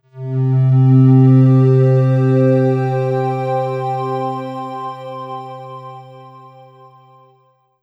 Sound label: FM Pad C; Pads